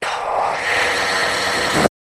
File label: jingle